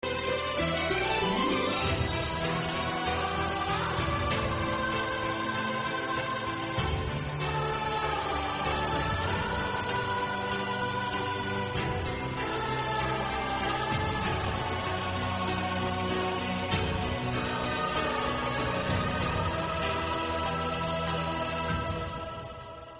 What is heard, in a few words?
sad ringtone; munna bhai mbbs